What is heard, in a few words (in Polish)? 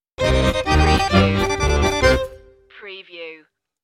kresowa polka stinger